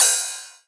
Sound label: Ride, Rides; Electronisounds